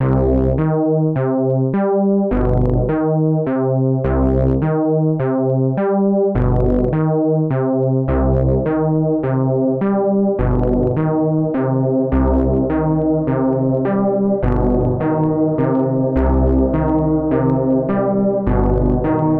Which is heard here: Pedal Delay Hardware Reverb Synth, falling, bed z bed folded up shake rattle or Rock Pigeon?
Pedal Delay Hardware Reverb Synth